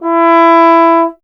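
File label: bass trombone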